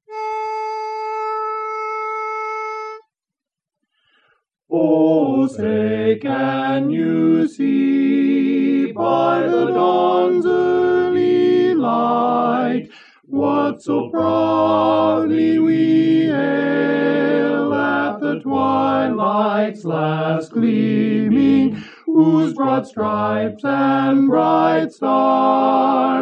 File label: tenor, Star-Spangled Banner; Barberpole Cat I Learning Tracks